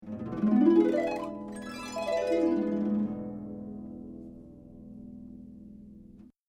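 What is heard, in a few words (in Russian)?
арфа переливы вверх и вниз восточная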